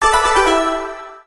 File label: win increase win level